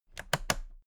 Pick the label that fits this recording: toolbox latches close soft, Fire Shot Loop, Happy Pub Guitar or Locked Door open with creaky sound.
toolbox latches close soft